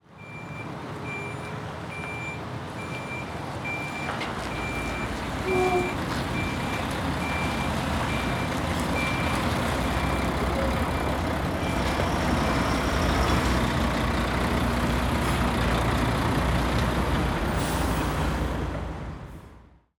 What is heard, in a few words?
truck reversing; transportation